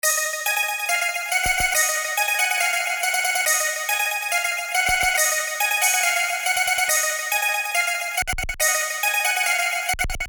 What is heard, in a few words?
Beat